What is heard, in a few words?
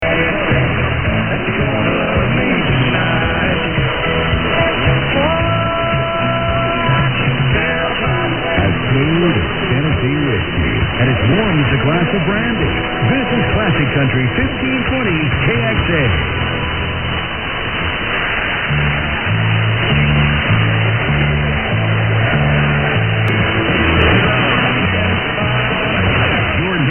classic country; 1520; kxa